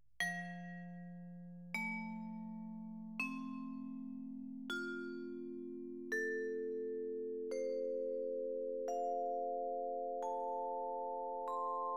Converter Resolution Test, -20dB Dither

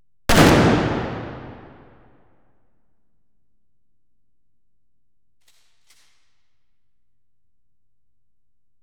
Explosions, Gunfire & Cannons, Pistols & Rifles; Telarc Sampler

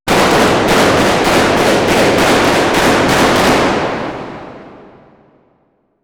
Explosions, Gunfire & Cannons, Gunfight; Telarc Sampler

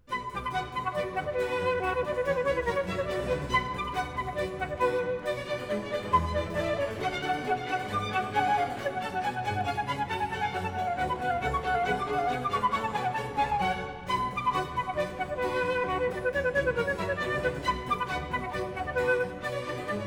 Flute, Kammerorchester

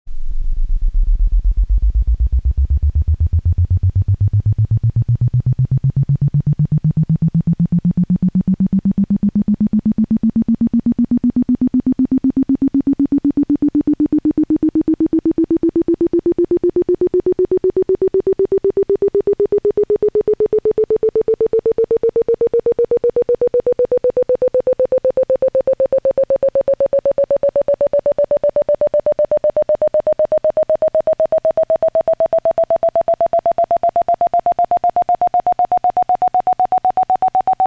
Music Articulation Test Tone; Stereophile; Stereophile Test CD 2

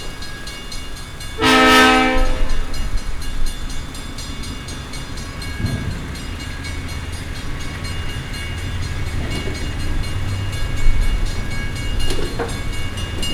Sound Effect - Freight Train Crossing

